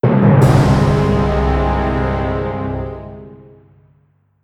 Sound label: jingle loser